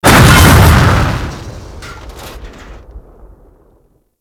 Metal Explosion; Warframe

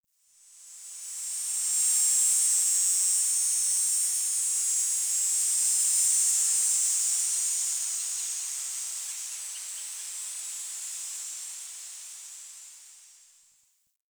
Tree Cicada

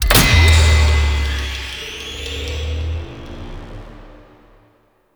Lights Flicker; Warframe; Sacrifice; Liset

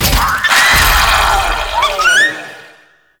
Rabbit Finisher SFX, Cinematics; Warframe; Duviri

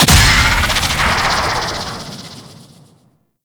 Grenade Explosion Blast; Infested; Zealoid Prelate

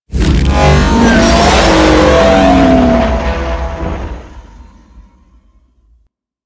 Ship Attack Maneuver Generic, Engines; Warframe; Vehicles; RailJack Enemy Ships; Corpus; Maneuvers

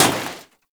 Scooter Impact; Warframe; Ambience; Nineteen Ninety Nine

